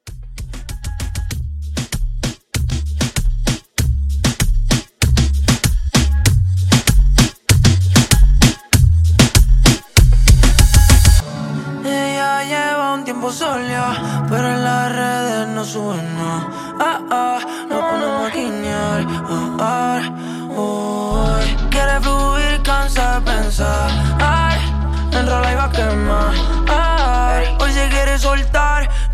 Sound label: Party Reggaeton Re Drum